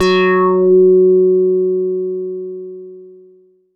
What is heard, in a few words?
Rezzer f#4, Korg Poly-800